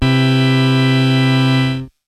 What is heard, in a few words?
HOUSE CHORD; TECHNO-BOX; SYNTH+BASS